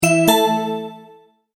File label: multimedia tone verified